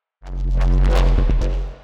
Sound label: neuro, bass